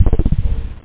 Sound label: star bass